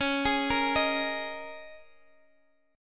D sharp 7th Suspended 4th C sharp, Guitar

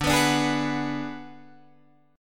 D 6th Flat 5th D down Guitar Drop D, chord

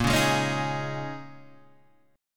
A sharp Minor 11th, down; strummed; JGuitar

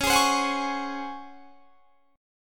Db Major 7th Suspended 4th Db down; strummed

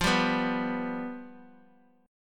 F Suspended 2nd Flat 5th, F

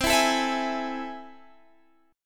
A Minor Major 7th, C

